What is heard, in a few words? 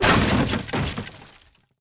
door break